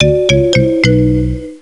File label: Song Lose; Girls Only; NDS